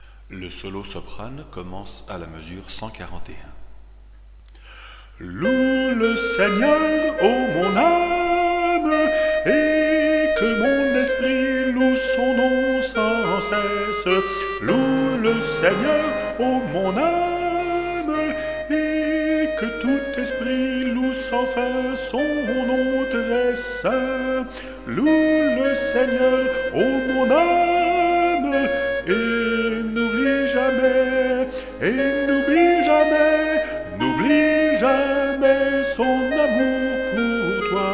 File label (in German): Lobgesang solo; Mendelssohn